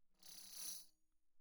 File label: tambourine finger roll pp; Percussion